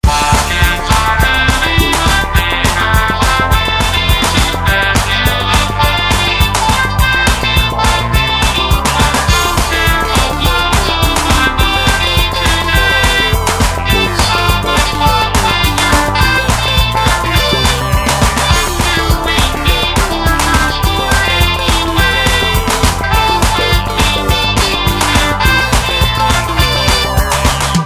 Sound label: arcade